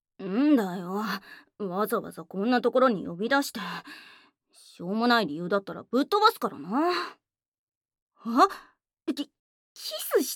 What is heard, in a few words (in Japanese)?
ツンデレギャル べろちゅー, ASMR